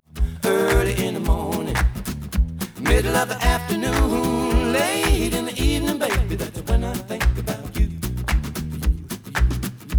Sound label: Stereo output noised; 3bit